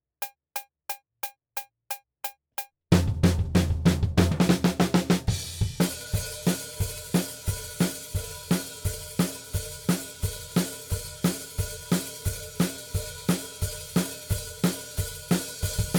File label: Drums ONLY; Pre Pro